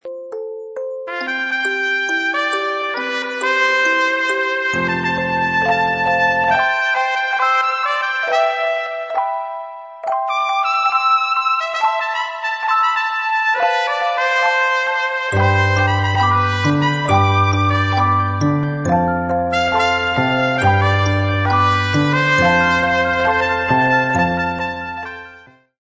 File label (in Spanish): navidad trompeta 135 jingle; Jingle navideño; Jingle gratuito navideño. Campanas clásicas